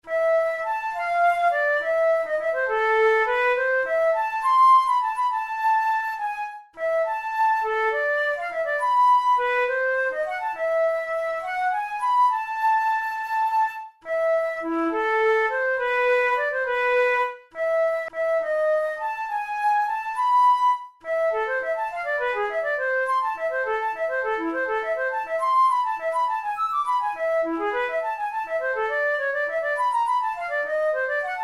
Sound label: study in a minor, flute tunes